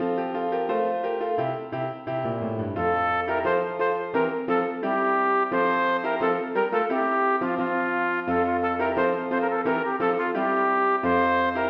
for trompet og piano; Bang

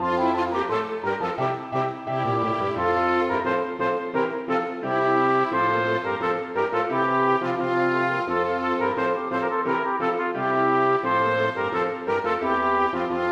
for trompet og lite orkester; Bang

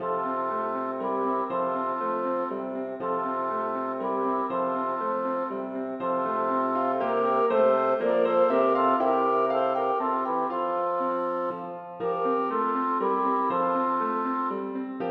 Menue for to klarinetter, fagott og piano; Bang; Peter Bang

